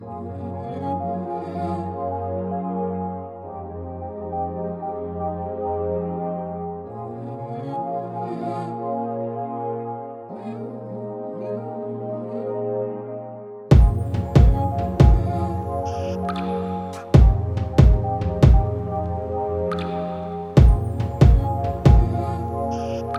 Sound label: love letter; mixkit